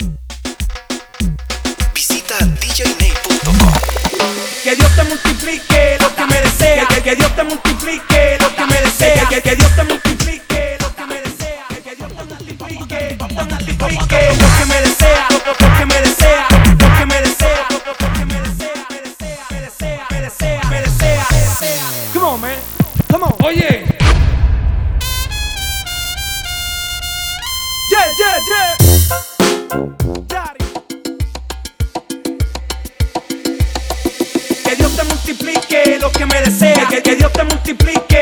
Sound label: Acapella